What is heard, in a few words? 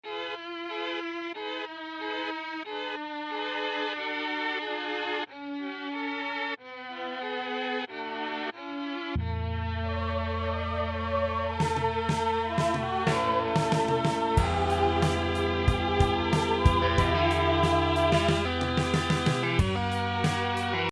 melotron arturia strings